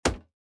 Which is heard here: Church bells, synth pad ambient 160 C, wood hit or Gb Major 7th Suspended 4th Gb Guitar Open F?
wood hit